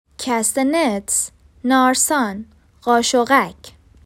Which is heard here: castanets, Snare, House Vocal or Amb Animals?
castanets